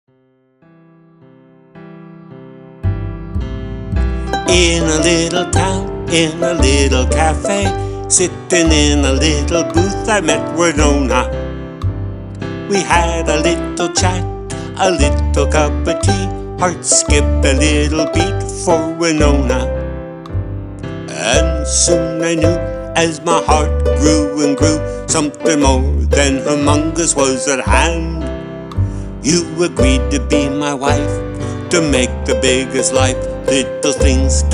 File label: Ukulele Love Song